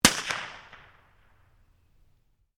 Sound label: RIFLE CZ 452 VARMINT 22 CALIBRE FIRING; A selection of domesttic firearms; Rifles; Guns